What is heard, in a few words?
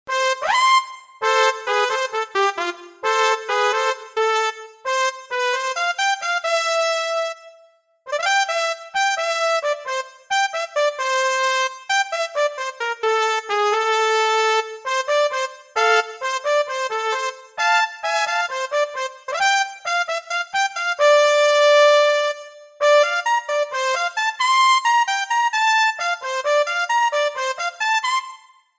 jingle melody trumpet